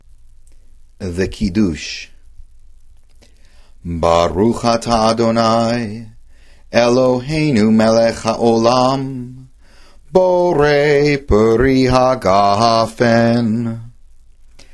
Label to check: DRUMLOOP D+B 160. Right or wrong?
Wrong